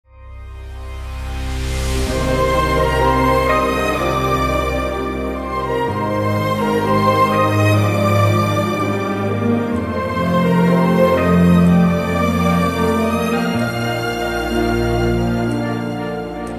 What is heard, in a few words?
Instrumental; Chhichhore Movie